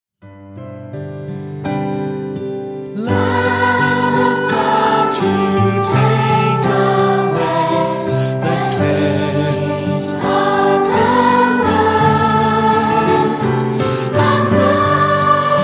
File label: Vocal